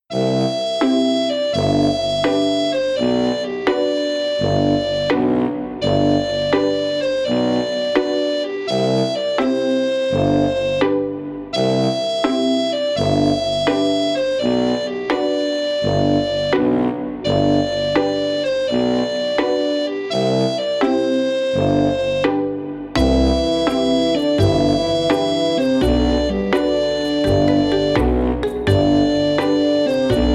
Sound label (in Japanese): ベースとドラムが主体のシンプル, おしゃれでかっこよくありつつも、どこかコミカルな雰囲気もある日常系BGMです